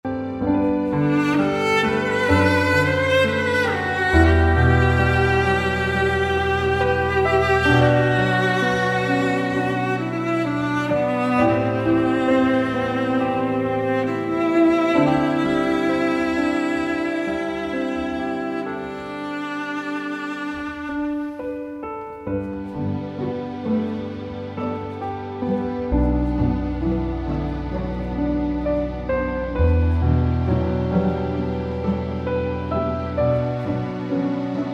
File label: Romantic 66BPM; mixology vol 1